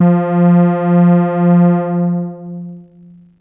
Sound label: Fat Moog F